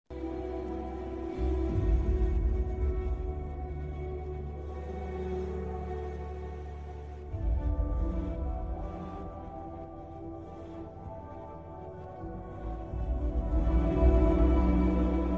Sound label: movie sad ringtone; the family star